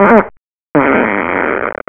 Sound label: Break wind